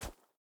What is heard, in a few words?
wolf footstep dirt hard; Rust